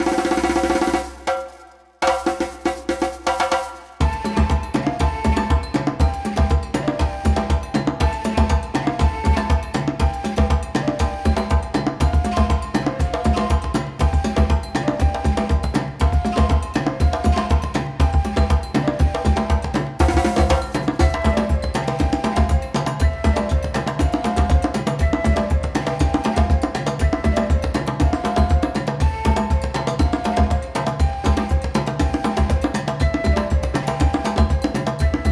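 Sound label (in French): Une souris verte play-back sans claps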